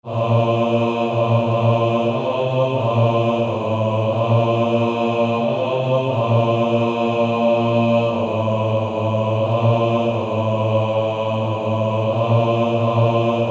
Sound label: Male Choir